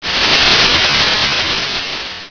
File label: steam rush